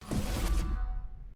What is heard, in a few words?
Teleporter; Valorant; Soundpad